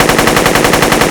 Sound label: AK attack